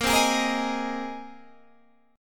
A sharp 7th Suspended 2nd Suspended 4th, Guitar Half Step Down chord